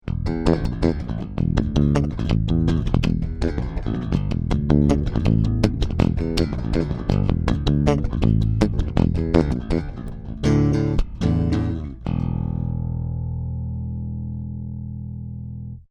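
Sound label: Warw Strea 2PU Pick, bass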